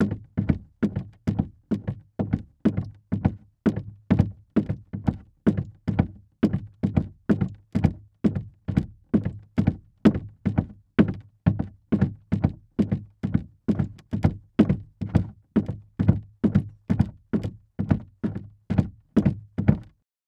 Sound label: Foley Footstep Golf Cleats Running On Wood Platform; Foley Footsteps